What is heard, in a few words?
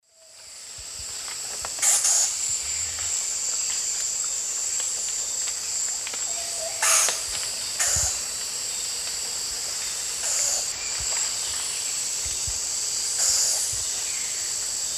Neomorphus pucheranii, calls; S. America; Eastern Lowlands